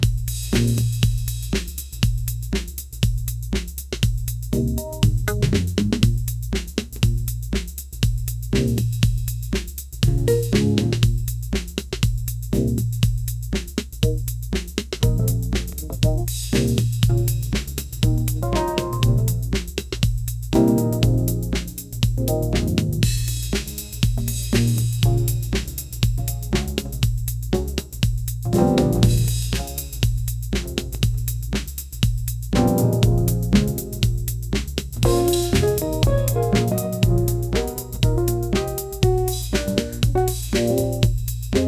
fun funky and fresh beat, funk

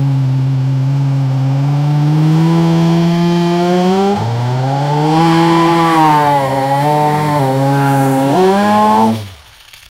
a classic pontiac gto

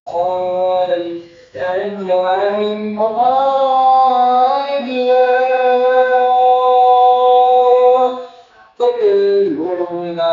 prayer islam and jews, devotional

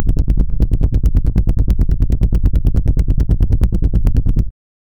bass pulse; bass pulse for a feeling

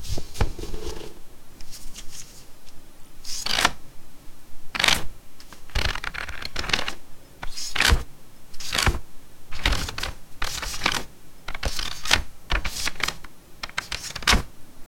book page sound along wit; books

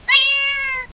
girl cat says meow

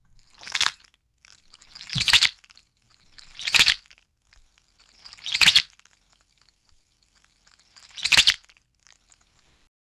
fast whoosh as object is

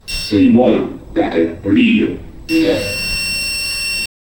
electronic jingles, robotic

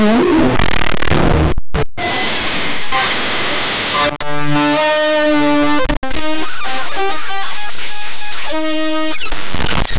really disgusting long fa, fart

